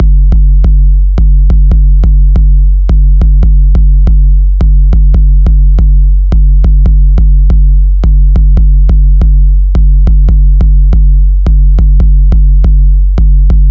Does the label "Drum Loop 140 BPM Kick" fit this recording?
Yes